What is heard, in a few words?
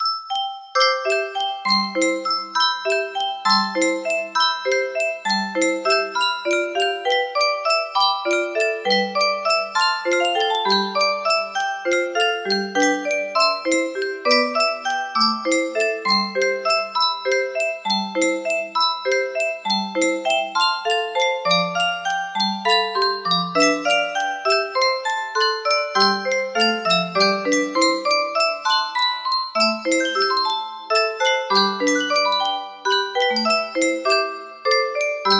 music box melody, 45 notes